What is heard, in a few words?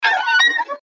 metallic squeak